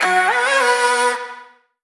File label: phrase F#, Vocal; Slate Digital Omnivox Vocal Sample Pack